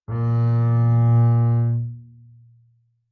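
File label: Bass arco fortissimo sul A Bb2, Double Bass; MIS Pitches - 2014; Strings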